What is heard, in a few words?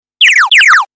Alien Phone